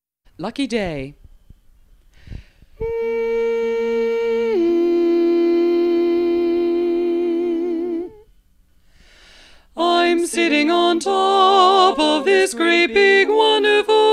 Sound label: Lead; region17online